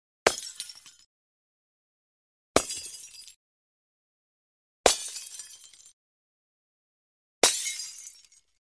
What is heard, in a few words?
Light Bulb Breaks Distant Glass Bulb Smash & Shatter; Hollywood Edge Foley Sound Library